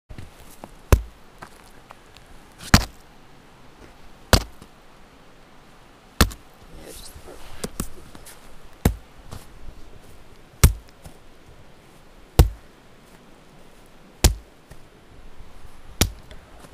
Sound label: Kick